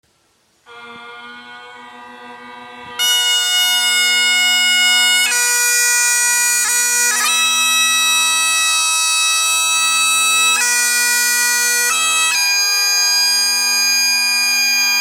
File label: taps bagpipes